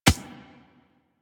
Beach Ball Floof Bounce Dirt

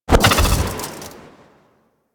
Universe Ring Charged End; Warframe; Warframes; Nezha

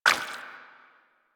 Pirate Footstep Default; Warframe; Warframes

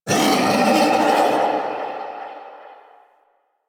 Feral Vocal Call; Enemies; Kubrow; Warframe

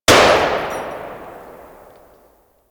Sicarus Tail 2D, weapons; DE; Warframe

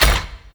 Melee Step; Warframe; Necramech

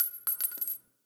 Shell Casing; Target Practice; Warframe; Nineteen Ninety Nine; Quests; Quincy

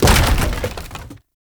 Crate Wood Medium Destroy; Objects; Destructable Wood Crates

